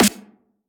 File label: snare; osu